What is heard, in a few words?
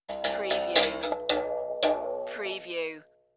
Berimbau Short Version